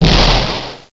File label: damage